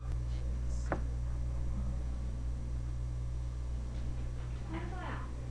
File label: cry